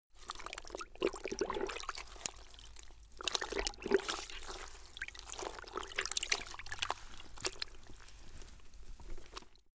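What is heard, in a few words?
cloth wring out, household; haus-garten; haushalt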